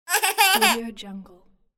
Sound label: short baby laugh